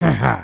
Walk, creatures organic

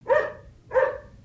Pet Terrier Bark; creatures pets

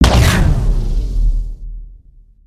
Rush Impact; Samurai-Ronin

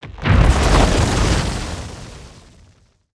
man eater spawn; weapons; aronwk collection; ndaudio

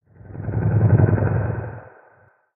Infection Swell

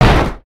Spawn Land; Dragon In A Box; destructibles

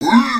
grunt; creatures organic

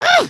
headspin, emotes

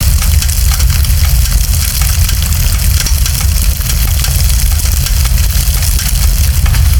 battle torch, ambience; Nimbus

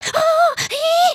freak out, emotes

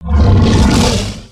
Scratch roar; Summoner

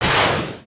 Rocket Launch

